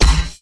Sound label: Stab G, bass